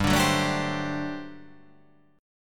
G Major 7th Suspended 2nd Suspended 4th G down

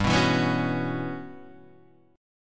E Minor 6th Add 9th G chord

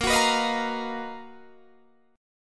B Major Sharp 11th A sharp

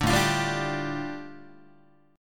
A 7th Suspended 4th Sharp 5th A, Guitar; strummed

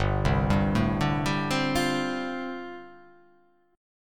G 7th Suspended 2nd Suspended 4th

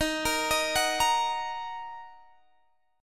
Eb Minor, Guitar, Half Step Down

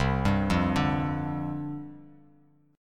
C Suspended 2nd Suspended 4th C Bass Standard; Csus2sus4 arpeggiated

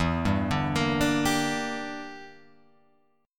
E Minor 7th Flat 5th, Guitar, Open F; Em7b5 arpeggiated; 4,2,2,5,5,2

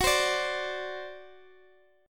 C Suspended 2nd Flat 5th, G flat, guitar chord

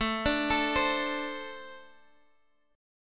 A Minor Double Flat 5th, Guitar; Ambb5; arpeggiated; chordshape; 17,17,19,17,x,x

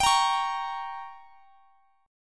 G Diminished; Gdim strummed; x,x,x,24,23,21

